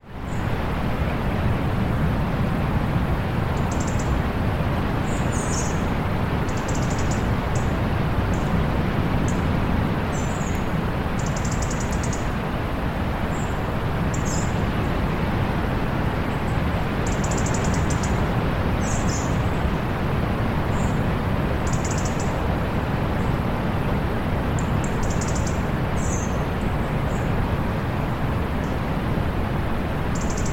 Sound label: distant sea humming ambiance